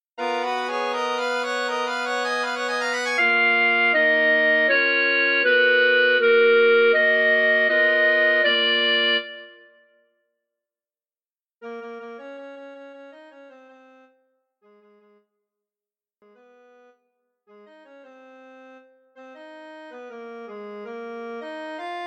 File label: chorus